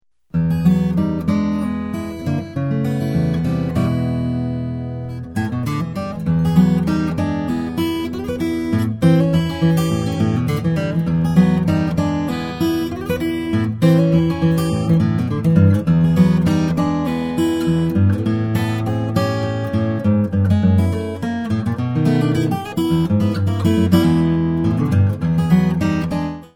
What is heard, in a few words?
funky junk